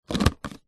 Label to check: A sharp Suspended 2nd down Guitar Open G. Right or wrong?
Wrong